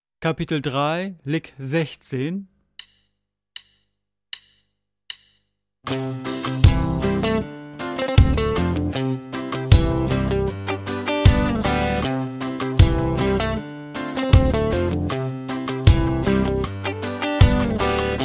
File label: Latin Style Guitar